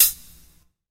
juicy hihat g key, Royality free foot hi hat sound tuned to the G note. Loudest frequency: 9886Hz; drum single hits one shots